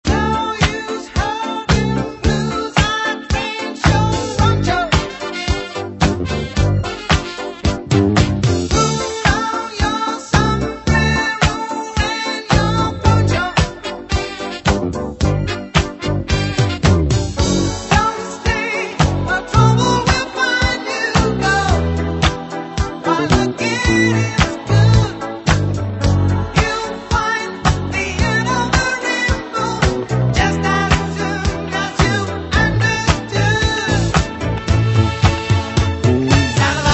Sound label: Nice Modulation